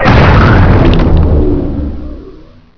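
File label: orb explode; Hexen II; eidolon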